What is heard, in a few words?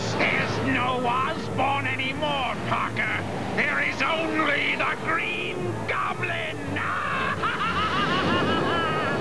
goblin